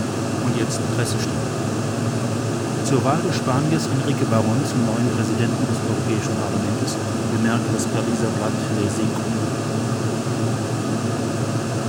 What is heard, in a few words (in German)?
noise mit sprachspektrum